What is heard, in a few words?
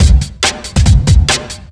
Breakbeat; Vengeance Samples; VEC2 Loops